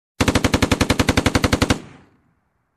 Machine gun shooting sound

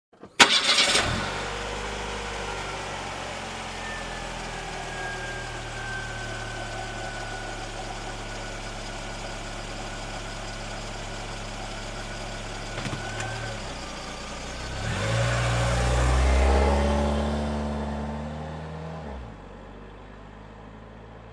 Engine start and drive away

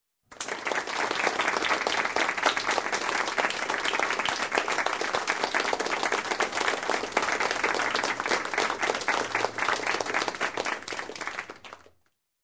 Round of Applause

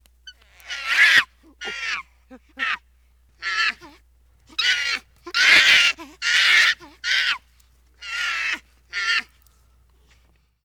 Monkey Screeches

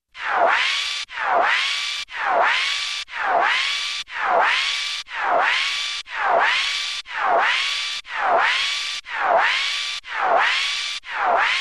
Alarm Tone up and down swelling

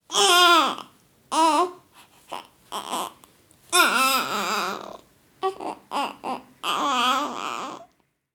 Baby whining short

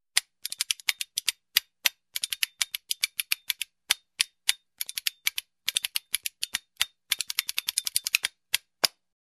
Western Spoons percussion with rolls

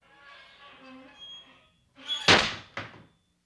Door Screen Open Slam

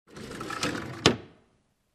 Sash window open

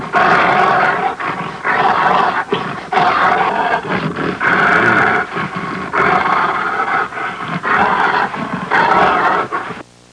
Miscellaneous grunting and roaring

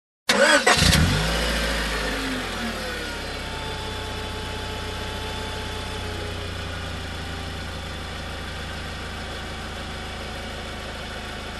Car engine ignition and running idle

